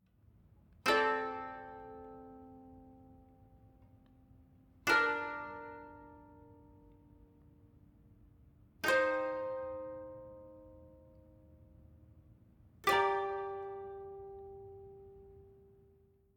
Savannah Music

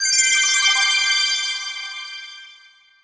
magic; Spanish

